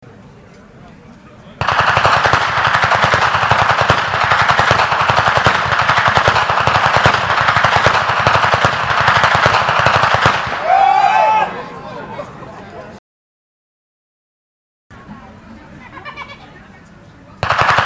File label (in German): Schnalzen; Feldkirchen; Ainringer